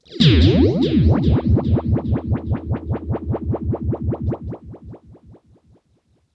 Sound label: RIPPLES L, Funk Guitar; 90 s Sample CDs; Zero G